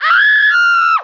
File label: female scream; cs2d; cityrp; pedestrian